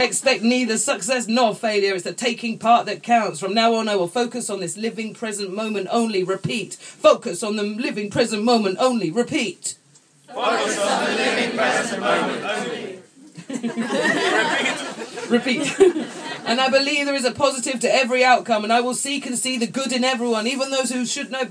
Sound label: poem sung; dolectures 2012